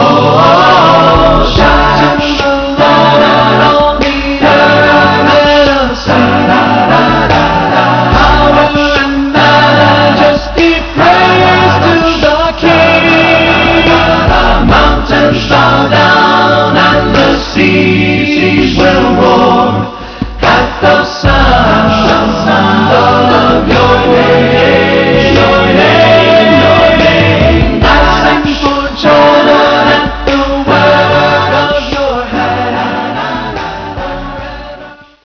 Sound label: shout